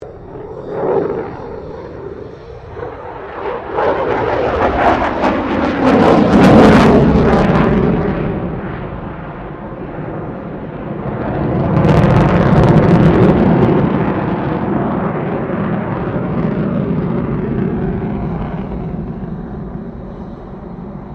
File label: 2009 FA-18E SUPERHORNET MANEAUVERING; RAAF FA-18E Super Hornet; Airplanes; Vehicles